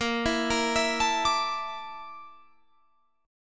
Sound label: A sharp Major 7th Flat 5th, down